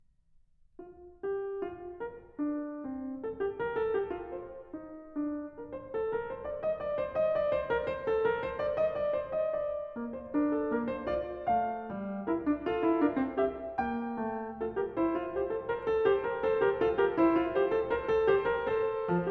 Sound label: Well-Tempered Clavier, Book 1 Fugue No. 21 in B-flat major; Bach; BWV 866